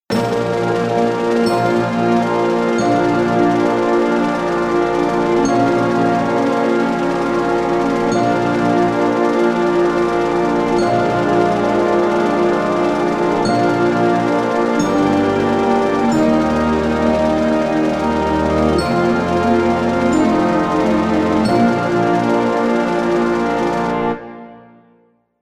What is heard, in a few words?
Band Warmups Band Recording chord monster; bandsandbox